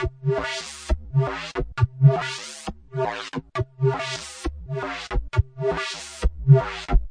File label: 135 Squeez Bass